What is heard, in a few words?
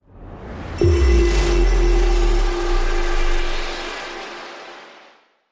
light energy hit; soundscrate